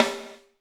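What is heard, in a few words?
snare drum, Drums; Bob Clearmountain Drums I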